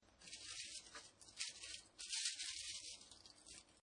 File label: Leaves Rustling, Dry leaves crunch rustle; Rustling Sound Effects